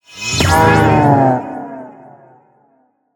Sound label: convert, mooshroom; mob; Minecraft